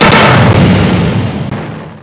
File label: boom; JOSEPHIN